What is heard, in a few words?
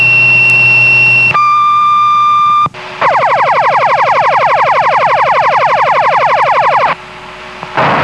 Moorestown Fire